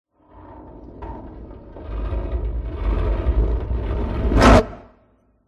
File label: Cinematic Metal Whoosh